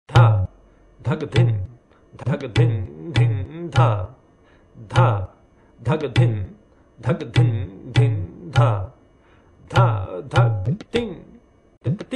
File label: tala tinvilambit